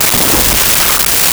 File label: skratch; Sound Effects Library (28Cds) plus bonuses